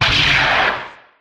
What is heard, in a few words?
cry